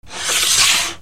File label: FRICTION